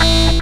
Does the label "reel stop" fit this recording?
No